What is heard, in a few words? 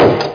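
door slam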